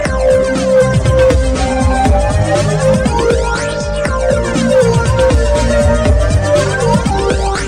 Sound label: soft tone